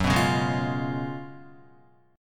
F sharp Major 7th Suspended 2nd Suspended 4th, Guitar; F#M7sus2sus4 strummed